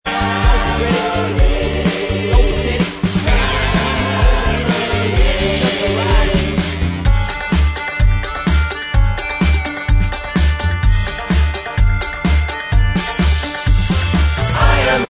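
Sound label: Backing Vocals Track, backing tracks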